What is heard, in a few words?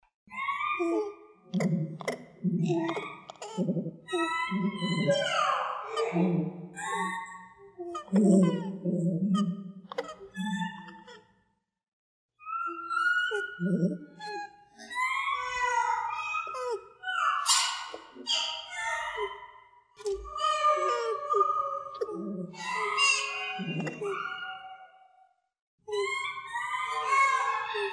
Voices of Lemurs, Garden of Lemurs; stereo version; Echoes of Lemurs